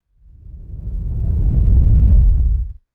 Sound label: deep cinematic rumble whoosh pass by; designed